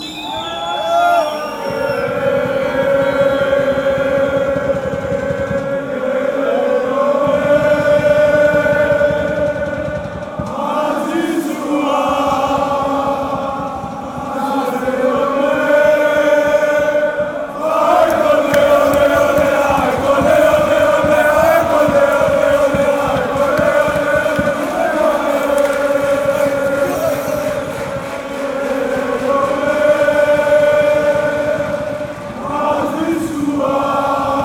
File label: aek athens chant